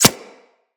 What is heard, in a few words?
Arrow Impact; Weapons; Bullet Impacts